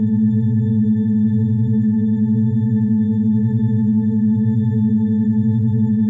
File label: hum; zaero; world; aural